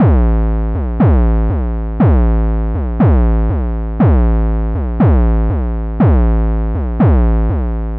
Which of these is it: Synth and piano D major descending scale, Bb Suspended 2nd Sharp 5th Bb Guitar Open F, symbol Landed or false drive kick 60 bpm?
false drive kick 60 bpm